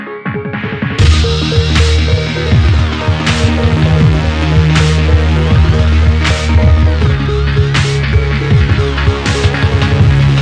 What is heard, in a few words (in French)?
Musique